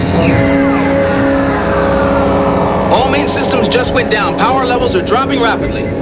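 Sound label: power drop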